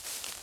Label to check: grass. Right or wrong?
Right